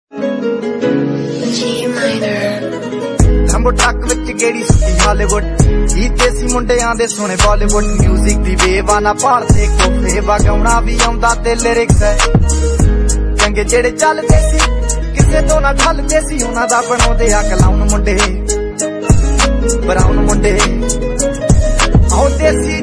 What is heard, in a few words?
Song Ringtone